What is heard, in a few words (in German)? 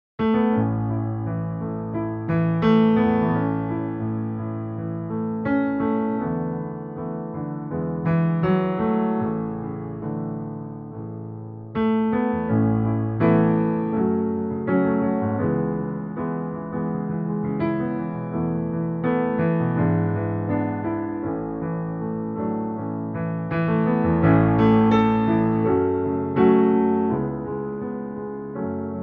Pianoplayback Sängerin in A-Dur